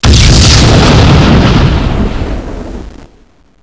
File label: gluon hit wall; svencoop; custom weapons